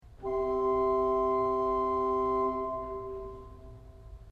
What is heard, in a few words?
I votha flavta 4 C